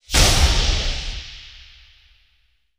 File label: Flash Frame Crackle; Sound Design